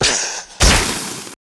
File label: Spawn Spiderlings fail; Dota 2